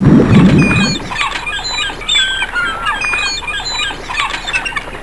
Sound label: OCEAN